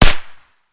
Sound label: pmm silencer, weapon